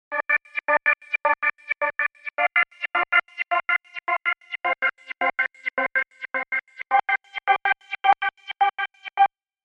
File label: serum seqs arp; chiqui audio